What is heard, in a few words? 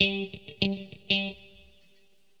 GUITAR, ROCK POP; MAGIX